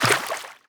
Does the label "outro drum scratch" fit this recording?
No